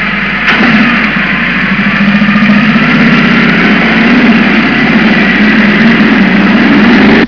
big bike

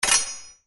Sword hit

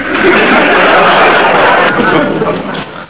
canned laugh

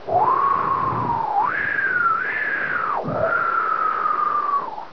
whistling wind

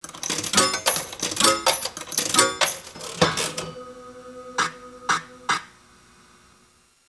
slot machine insert 3 coins and spin

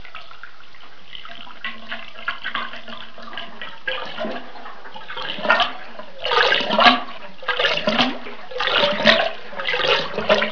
drain emptying

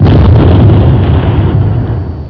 big boom

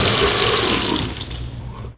water, water sounds

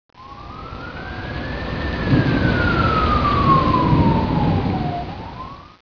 rain thun Siren

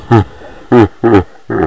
laugh slow

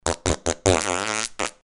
Pushy fart in stages

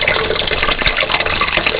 water running loop

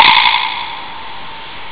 spin, cartoon sounds

